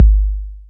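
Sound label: BASS DRUM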